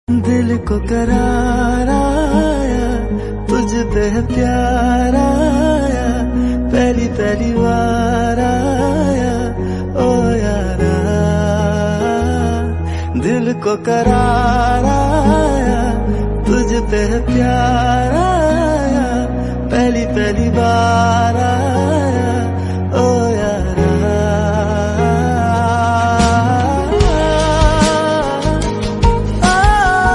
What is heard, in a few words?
Love Song Ringtone